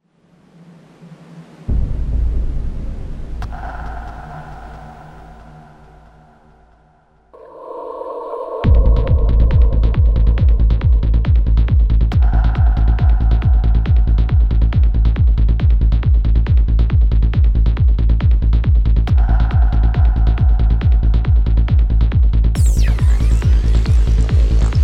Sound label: teleport terminal